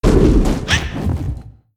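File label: meerkat spawn; monster; Senillinea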